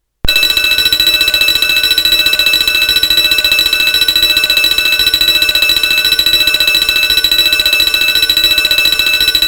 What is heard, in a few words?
Bell Tone